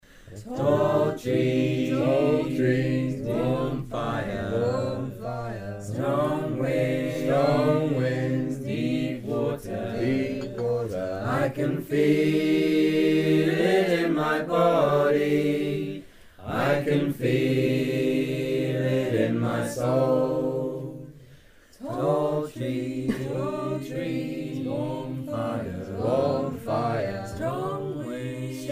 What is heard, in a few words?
Tall Trees Warm Fire